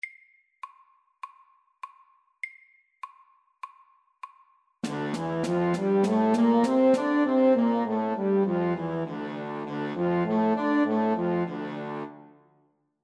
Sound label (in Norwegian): D moll natura FORT